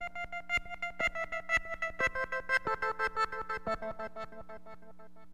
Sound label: FOOLED, HipHop Keyboards